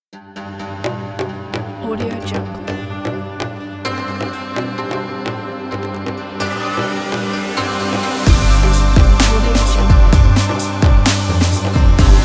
arabic logo

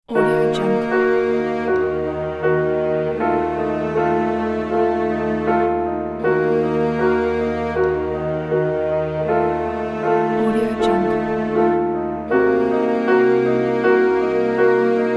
cinematic

